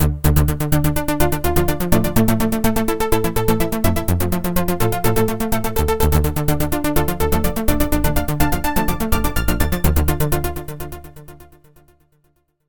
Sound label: Pulse Magic; oasys assault